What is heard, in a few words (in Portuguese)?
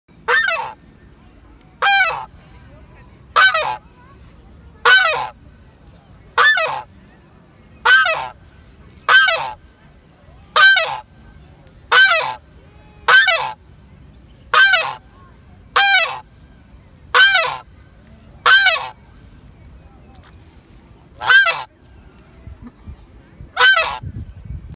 canto do ganso pigmeu africano